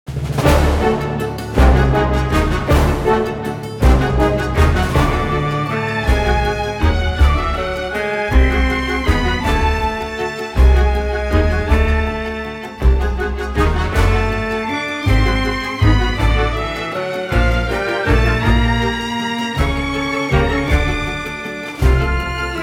Orchestral Comedy Animation; Cutdown

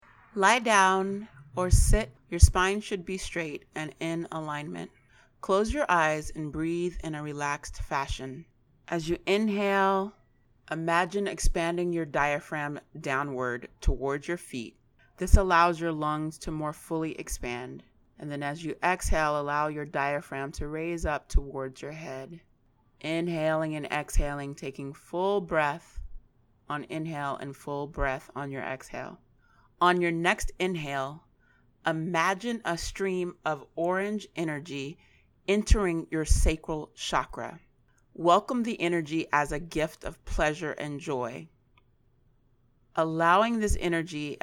Sacral Meditation; 40 Days Sacral Meditation

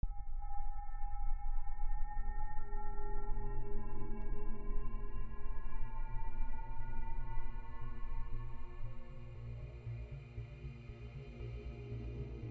Fast run subtle

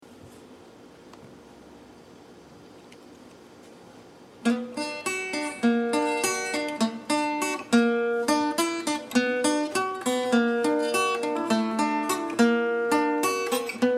Pop Song

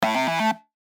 Bass Section

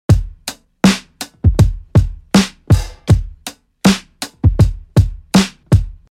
80 DRUM LOOP CLASSIC 90S STYLE

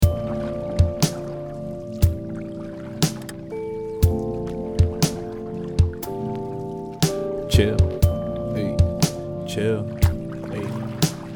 CALM, COOL

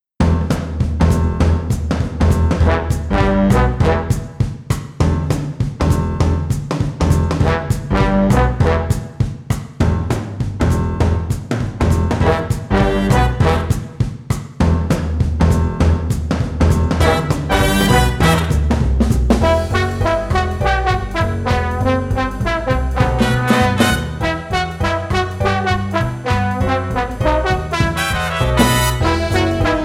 Swing

